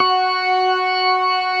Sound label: 66 STOPS, Synth and Organ; InVision Interactive; Keith Emerson; The Most Dangerous Synth and Organ; ALL STOPS